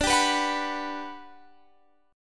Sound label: G Major 7th D